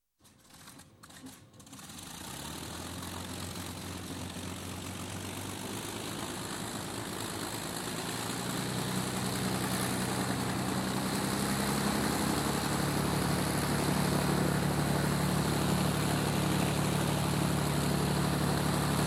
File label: Avro Lancaster, exterior, start up engines, taxi past (Avro Lancaster, World War II bomber, 4 piston engines); Airplane Sound Effects; BBC